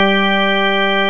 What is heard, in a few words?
KURZ ORG G3, KURZ ORGANS; Greytsounds VOL-1